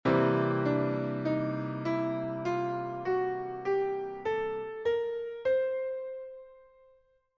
composite, blues piano; Réservoir de Notes pour la tonalité principale

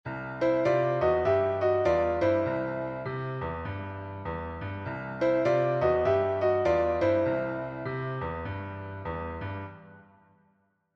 sixtes, Riffs en sixtes, Blues Piano; Blues Licks

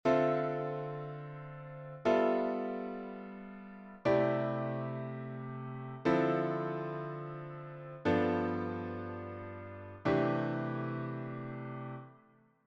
accords composes, jazz; shell + Voicing Main Droite; Comme la 3ce ou la 7ème est présente dans le shell, on allège alors le voicing correspondant.; Enchainements ii V I

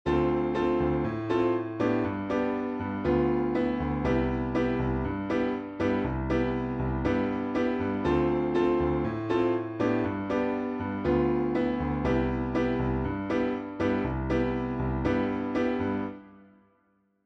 251 majeur, latin jazz bossa

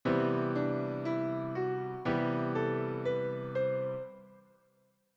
lydien, improvisation jazz; Gamme Majeure; Gamme Majeure et Modes Associés

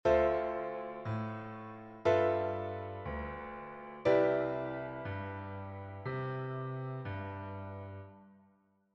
walking bass blanche; Fondamentale et 5te de l'accord; Enchainements ii V I

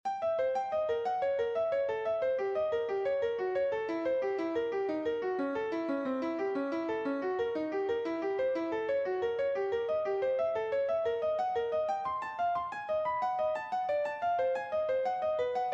lick brother, gamme diminuee; Gamme Diminuée Demi-ton Ton

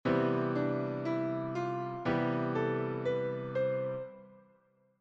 gamme majeure; Gamme Majeure et Modes Associés

